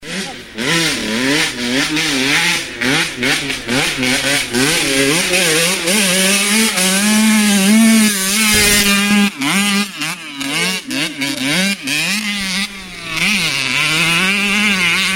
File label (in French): moto dans les bois